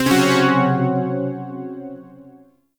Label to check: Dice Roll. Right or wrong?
Wrong